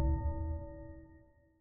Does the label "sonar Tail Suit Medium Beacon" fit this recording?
Yes